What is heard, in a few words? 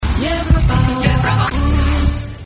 jingle; europaplus